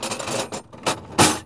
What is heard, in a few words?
Tool box; INDUSTRIAL